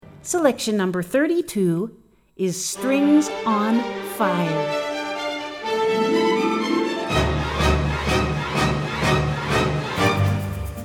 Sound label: Strings On Fire; VarietyCD